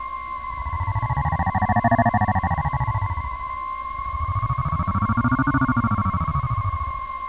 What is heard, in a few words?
alien; ambience